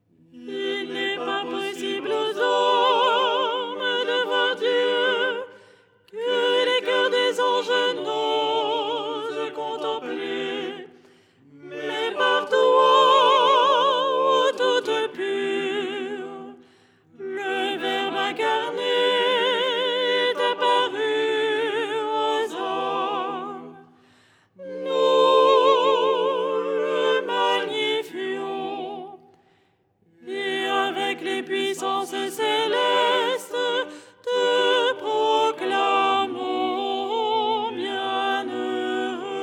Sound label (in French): soprano; 9ème hirmos du canon de la Pannykhide; Les 8 tons en français; ton 6